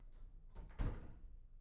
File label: door slam; IEEE AASP